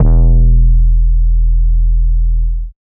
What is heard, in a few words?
808 monster E, Tonal; Hip-Hop; Slate; CAICOS Hip-Hop Sample Pack